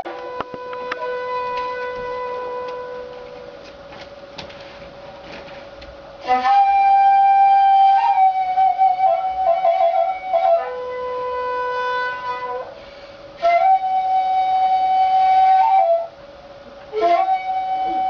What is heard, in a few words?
Shakuhachi